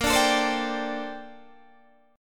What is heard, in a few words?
A sharp Major 13th, Guitar Open D